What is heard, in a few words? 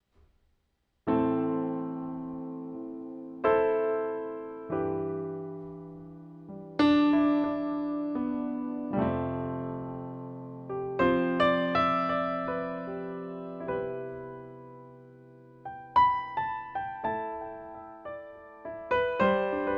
Pianos